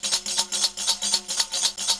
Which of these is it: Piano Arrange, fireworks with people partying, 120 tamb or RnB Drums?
120 tamb